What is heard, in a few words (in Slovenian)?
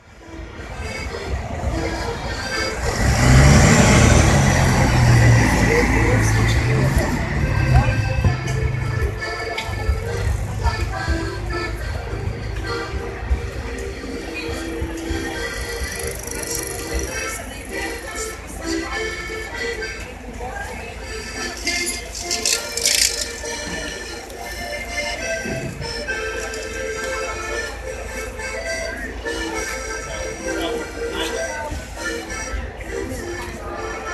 harmonikar na Slovenski cesti, street music